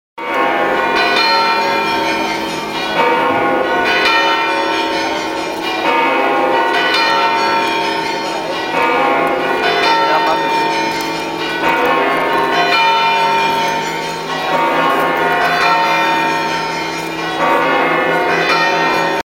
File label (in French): carrillon